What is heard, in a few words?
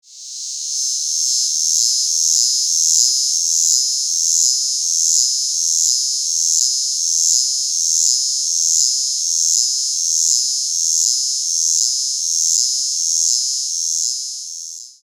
Cicada chorus